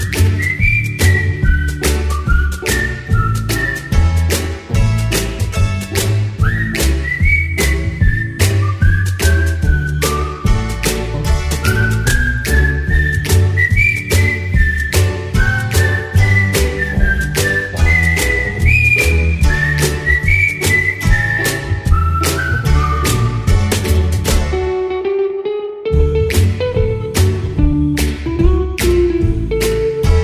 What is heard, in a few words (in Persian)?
وسترن سوت کابویی